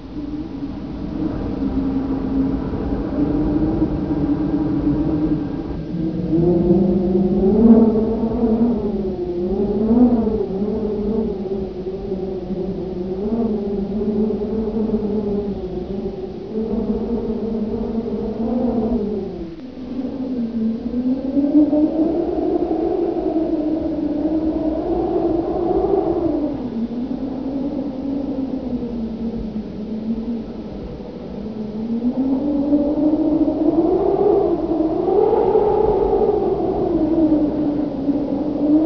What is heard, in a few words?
wind; sadhill